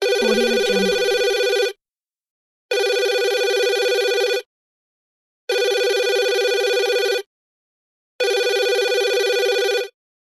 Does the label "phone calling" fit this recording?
Yes